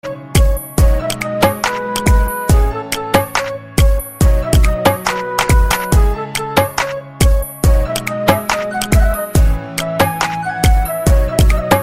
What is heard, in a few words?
Slow Beat